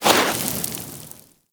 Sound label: Sword Elemental Fire Whoosh; Warframe; Teshin; Weapons